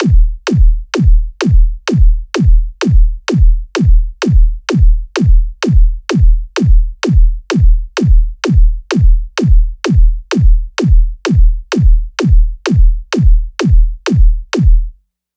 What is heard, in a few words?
Beat Village Club 128 bpm